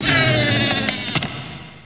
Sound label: whish; da capo vol1